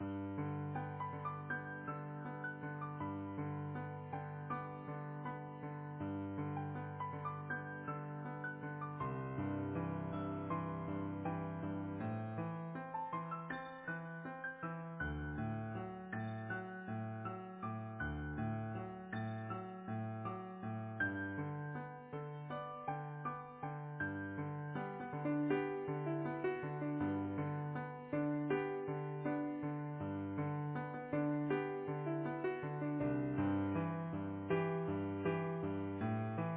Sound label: Easy Piano